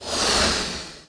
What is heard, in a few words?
Wither body